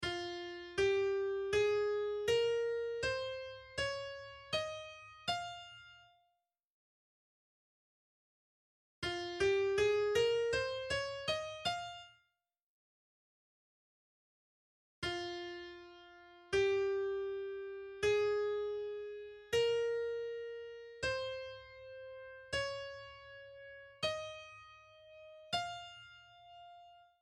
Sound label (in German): tonleiter; Musik; Realschule